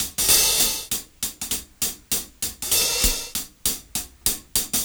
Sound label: Cym Beat 99 BPM Hats; AMG - Keith LeBlanc Phat Butt Jams